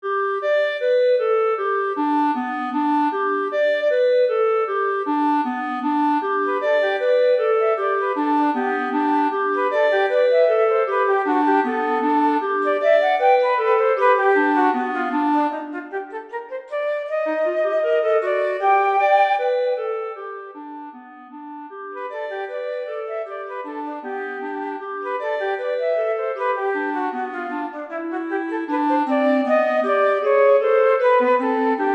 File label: for flute and clarinet; BURBLE